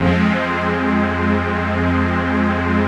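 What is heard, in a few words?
chord pad, Pads; Best Service; XX Large - Pads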